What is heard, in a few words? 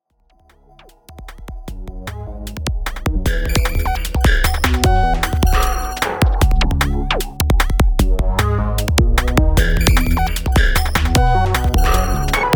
Battle Gongs; electronica